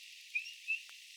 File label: Chirp